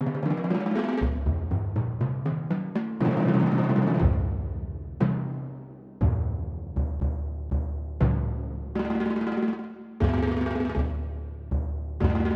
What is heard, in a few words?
Dry Drums